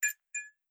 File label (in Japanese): 蛍光灯